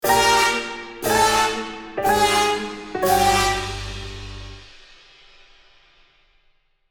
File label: Comedy cartoon wah wah wah fail trumpets